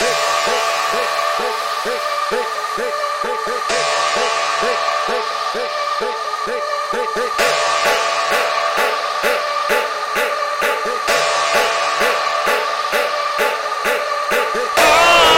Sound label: Phonk Music; فانک phonk